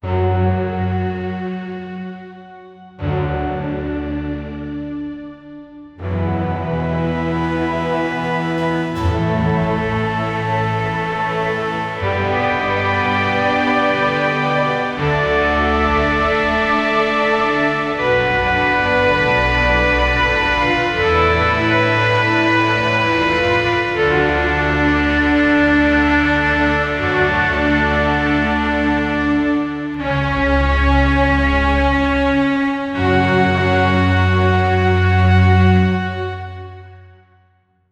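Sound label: epic emotional orchestral; seamless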